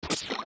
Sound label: Steps Lava Jump; zelda; OOT